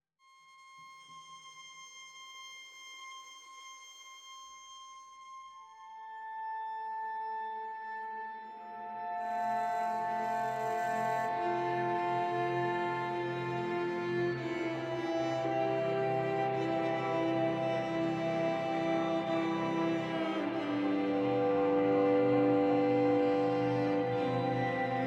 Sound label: Exploration Villages Strings